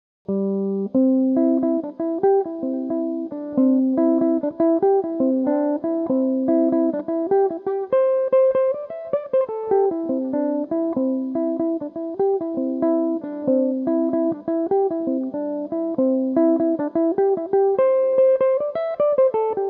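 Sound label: C major, Irish mandolin; The Hole In The Hedge; Recorded for the Mandolin Cafe's Song-A-Week Social Group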